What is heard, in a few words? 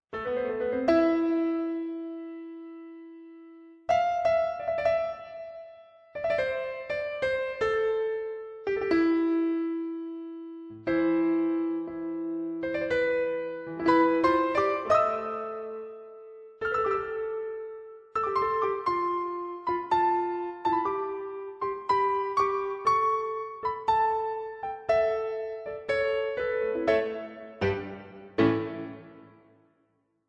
Bossa Bed 60 GTR Mix Down; Surround Session